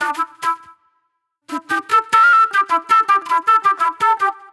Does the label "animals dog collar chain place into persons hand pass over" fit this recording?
No